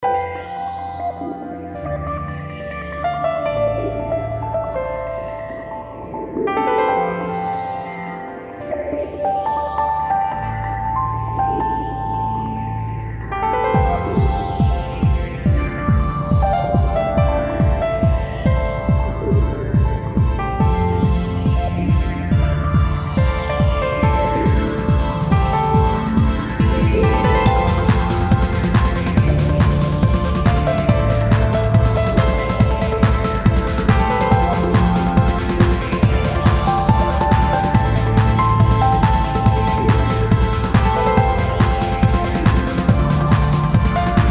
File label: Solar Flare, electronic, reflective; Solar Flare Loop